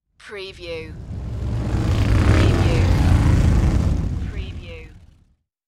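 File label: Motorcycle Drive By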